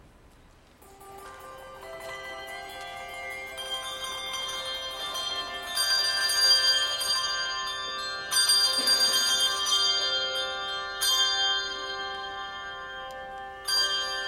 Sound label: Anthem, Carillons